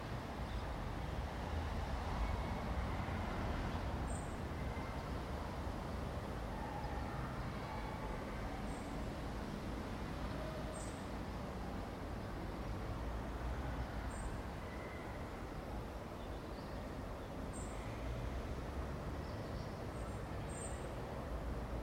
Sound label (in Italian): campane automobili aereo, Suoni Ambientali, Alta Vallagarina; Calliano; Portobeseno